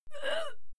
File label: female fight scream pain muted; schreie; menschen